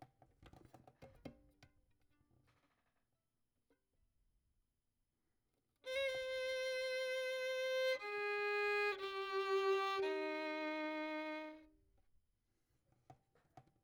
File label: Violin; Verdigris Music